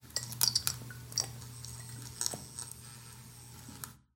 light flicker, ambient lights

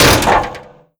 vent step, headcrab reviver, creatures

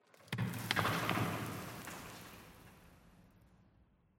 tenement concrete debris; ambient, rocks

